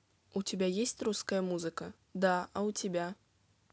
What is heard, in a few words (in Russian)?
Русская музыка